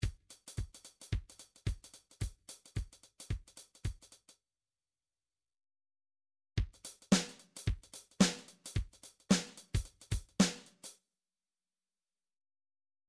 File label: drums